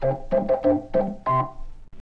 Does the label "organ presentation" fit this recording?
Yes